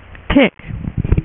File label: tick; superheroes